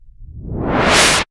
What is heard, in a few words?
IMP WHOOSH